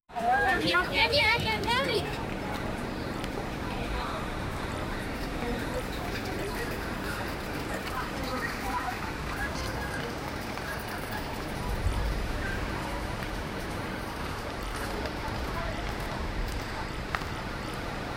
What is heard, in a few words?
binaural track of large, people